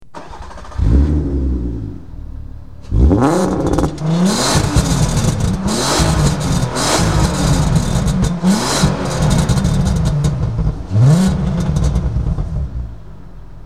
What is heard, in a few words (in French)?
golf R32 - point mort, volkswagen